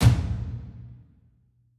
drums

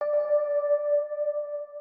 organ

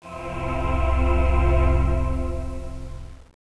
pad; Angelic Layer

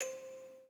musicbox

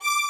violin

